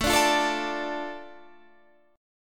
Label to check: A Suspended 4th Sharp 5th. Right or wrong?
Right